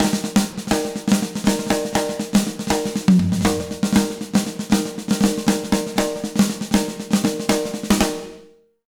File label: BEAT, Jazz Latin Drumloops